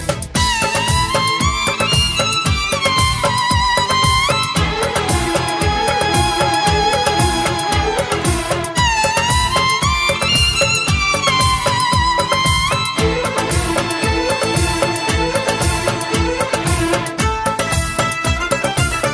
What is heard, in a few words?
Instrumental Ringtone